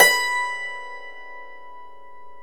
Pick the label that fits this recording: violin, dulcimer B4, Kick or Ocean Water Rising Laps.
dulcimer B4